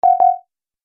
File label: Multimedia Error, beep; Business Programs, Apps And Computing Noises; Andrew Fleming